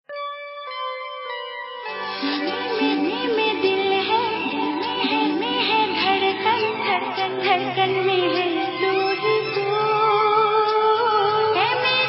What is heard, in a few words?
hindi love song